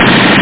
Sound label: rapid